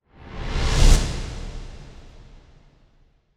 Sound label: Whoosh